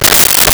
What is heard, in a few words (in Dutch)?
deur squiek; Home-made